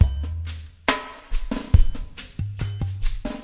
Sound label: 070 bpm superslow ghost rolls, drum loops and breaks